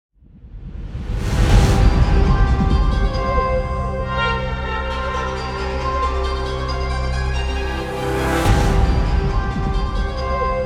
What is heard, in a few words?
event warning; heroes of the storm full gamerip 2015; hanamura